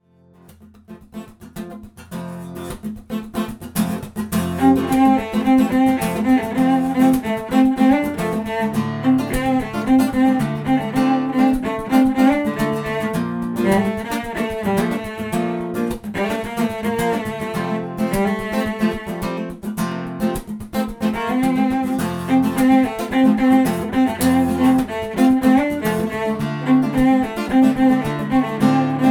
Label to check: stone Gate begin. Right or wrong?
Wrong